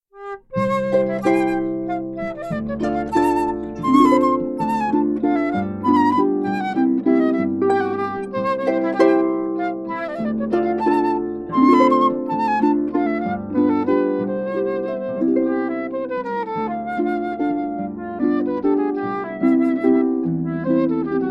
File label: classical, harp